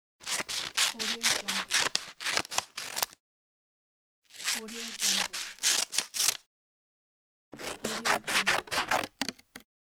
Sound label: cutting paper; mantikore